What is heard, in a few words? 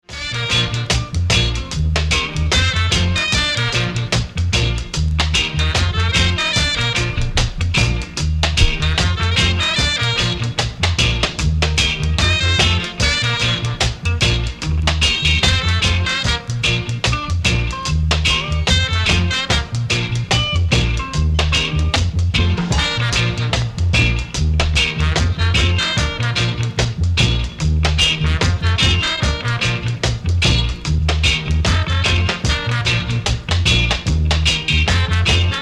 African Beat